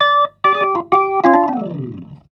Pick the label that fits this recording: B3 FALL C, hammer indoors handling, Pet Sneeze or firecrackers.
B3 FALL C